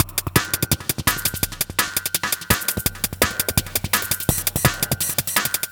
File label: alien tech beat; FRENETIC FRACTAL GROOVES